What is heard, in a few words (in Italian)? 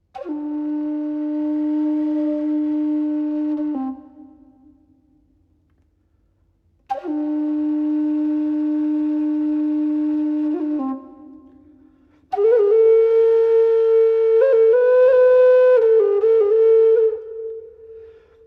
FLAUTO